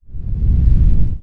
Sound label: geyser rumble; casualtiesunknown